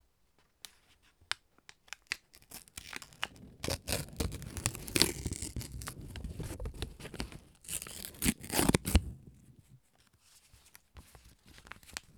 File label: asmr rippng tearing crumpling paper sounds; soundscapes